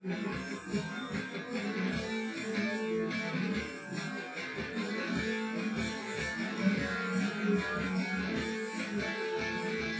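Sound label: with drums; SiSEC10; underdetermined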